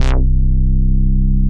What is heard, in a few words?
Funkline, Bass; Instruments; Basses; Ableton; Creative Extensions